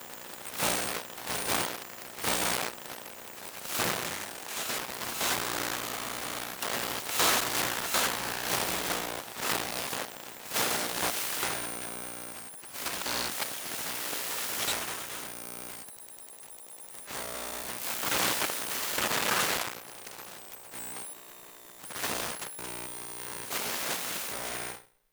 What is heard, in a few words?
Electromagnetic Ipad Sequence; Mono; Essentials Series NOX SOUND